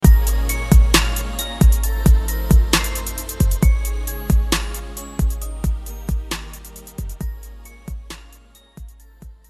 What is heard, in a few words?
Soft Bass Sms